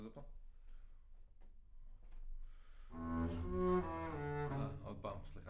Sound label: Bass Room